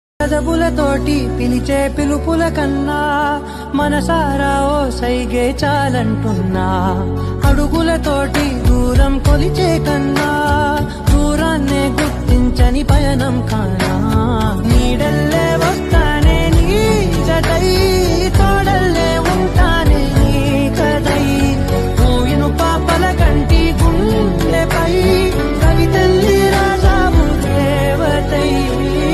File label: love bgm mobile ringtone south bgm ringtone tamil ringtone telugu ringtone